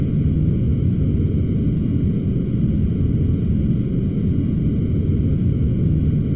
deep loop; Undertale

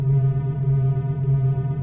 tone; Undertale

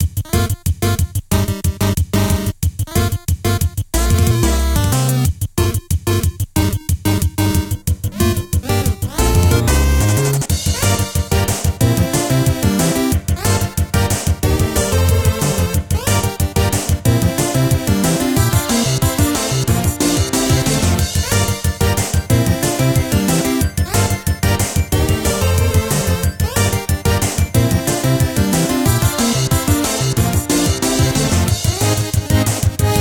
battle; Undertale

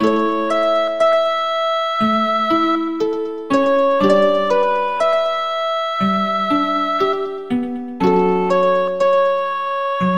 musical; Undertale

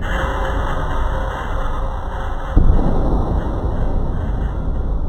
core ambience; Undertale

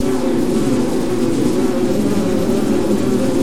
buzzing; Undertale

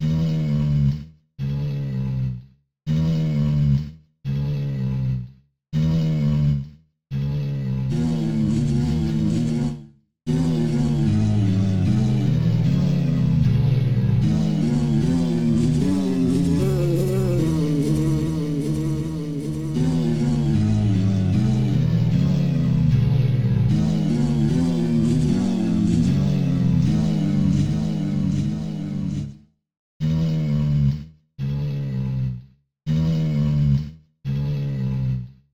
snore symphony; Undertale

